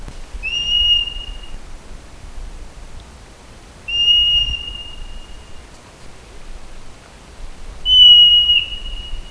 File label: marmot